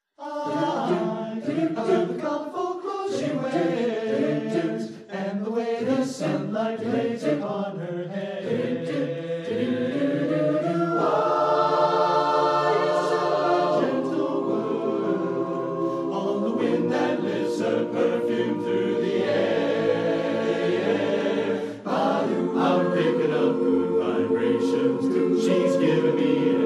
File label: acapella pops; speciality ensembles